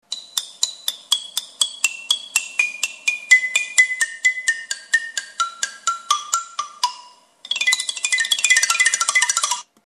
Trung

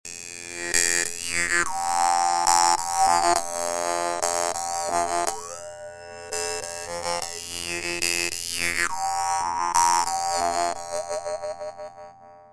Dan Moi

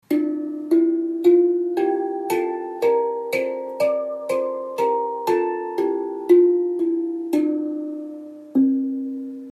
HandPan

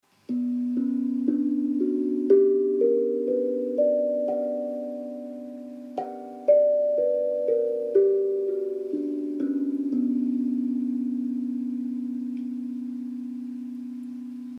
Zenko Solstice Schlägel

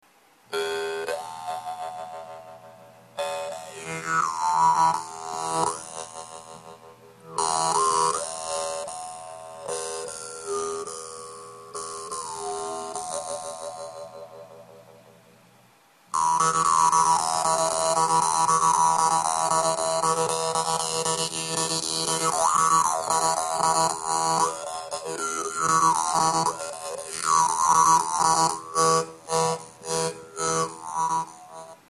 Bass Dan Moi

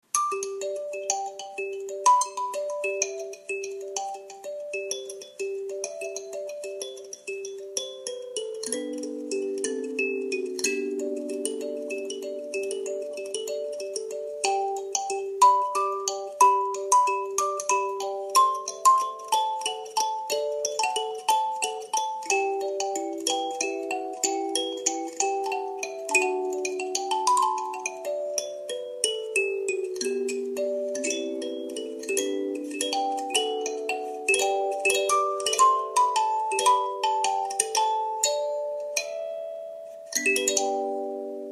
Celeste

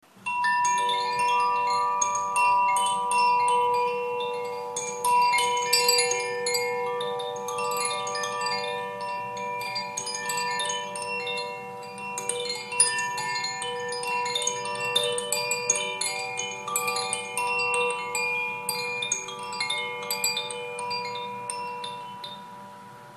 Zaphir Crystalide

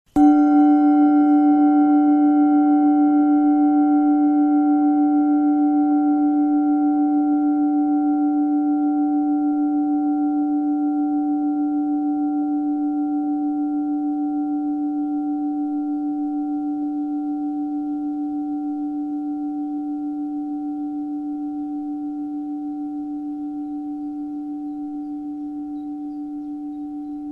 PLANET BOWL Mars

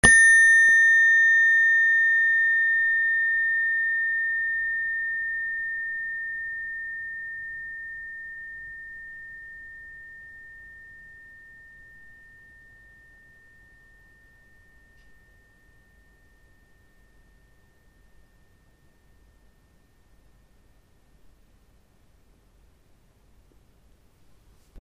space bar; siderischer mond

